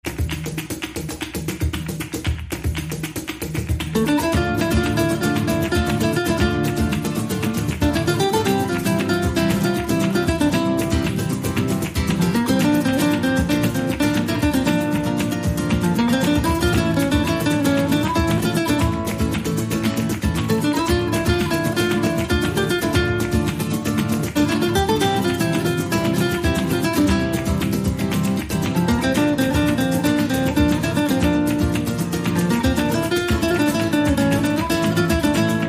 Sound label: Flamenco